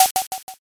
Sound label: card flip